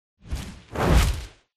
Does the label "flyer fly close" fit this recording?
Yes